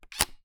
Camera Mirror

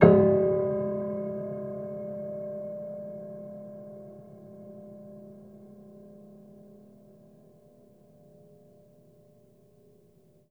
Harm Ped Low, Piano Harmonics

